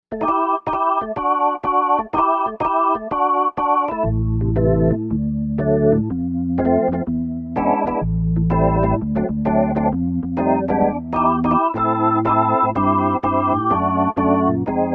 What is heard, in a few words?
Organ, Korg M1Rex; Factory Patches